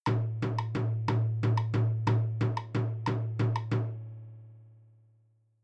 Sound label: 3 2 polyrhythms